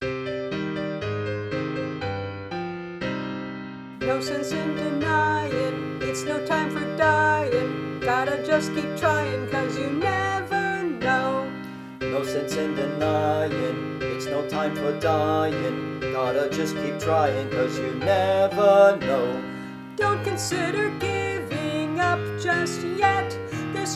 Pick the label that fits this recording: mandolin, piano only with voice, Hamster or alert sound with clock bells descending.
piano only with voice